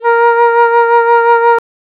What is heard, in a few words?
flute; match